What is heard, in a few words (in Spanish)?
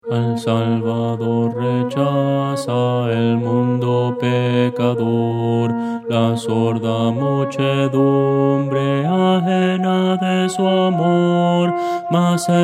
contralto